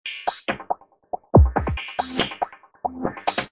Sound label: Nice Break, Techno Dance; Loopology; Crying & Dancing